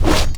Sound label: Generic Vault; Warframe; Characters